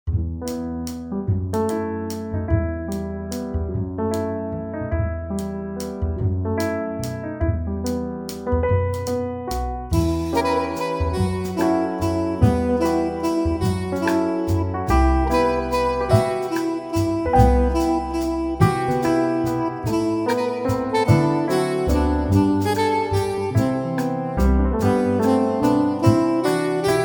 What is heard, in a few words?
play soprano sax reference, Em